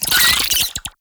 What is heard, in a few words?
Oraxia Brood Spider Vocal; Warframe